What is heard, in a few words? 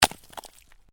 Ice: medium chunk of ice lands on hard surface; Snow; Stuart Duffield